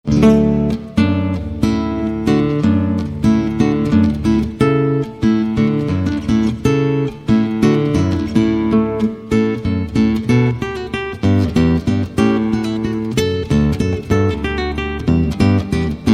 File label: water music, solo guitar